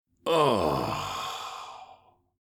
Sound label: Senior male satisfied moan